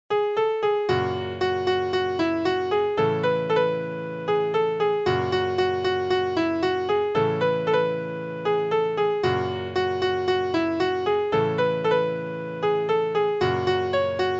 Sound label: Piano